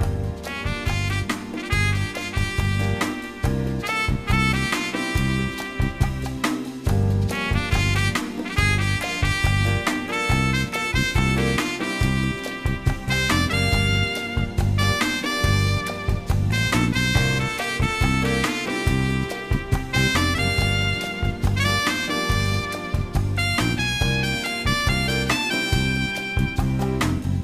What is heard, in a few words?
game over